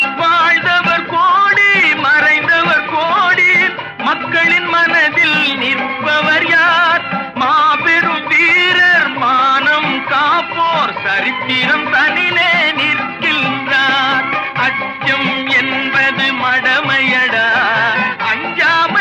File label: Ringtone; MGR; Tamil; 2009 Tamil Hit Ringtones